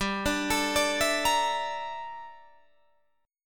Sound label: G Minor 6th Add 9th G down Guitar DADGAD